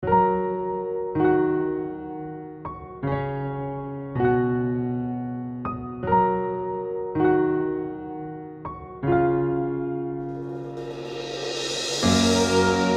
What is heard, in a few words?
epic cinematic piano